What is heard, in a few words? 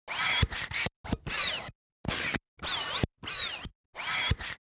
Sci-fi robotic servo varied shifting movement